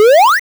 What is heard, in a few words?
Jump; Quarantine game